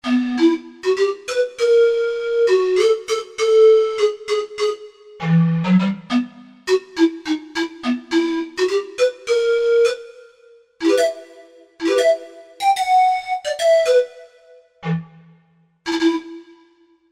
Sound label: Pan Flute, Korg Wavestation A-D